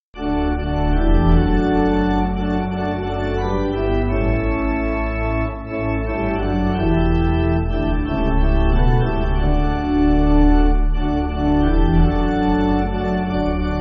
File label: Pipe, church music; I Was Presented; Swedish